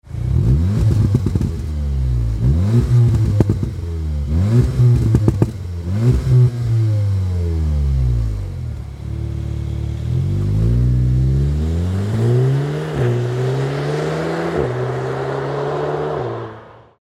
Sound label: VW Golf VII GTI OPF OPF Back VSD+ESD; REMUS